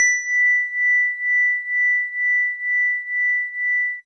House Organ DX100 B6

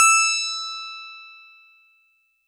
Harpsi FM DX100 E6

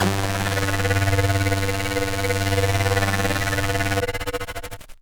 Sync Chopper Vinyl Synths G1, bass

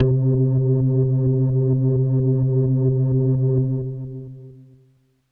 Leslie Kawaii C2, organ

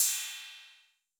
Cym B 808 Sat Decay A, Cymbal, Multi Saturated

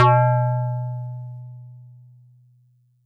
Metal Tube DX100 B3

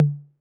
Tom Mid 808 Sat; Saturated

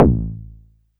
BD

